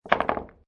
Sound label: Billiard Pool Snooker Put Several Balls On Table; Sports